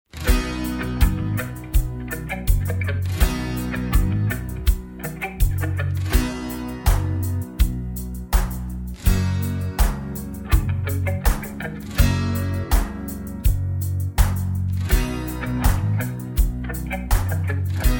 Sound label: (B To E) No Backing Vocals